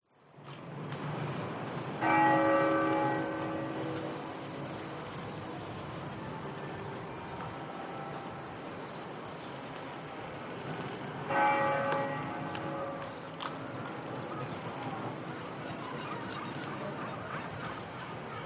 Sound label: Church Bells Distant People Walla Dog Wind Old Town Spain; PMSFX